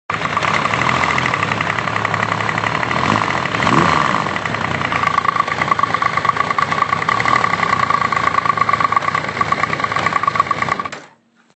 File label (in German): lose Schraube Sound, Motor Kupplung Kupplungsbolzen; Hayabusa